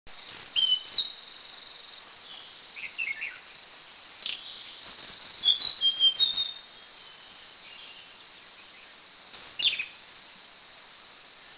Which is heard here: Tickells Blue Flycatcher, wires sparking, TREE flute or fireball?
Tickells Blue Flycatcher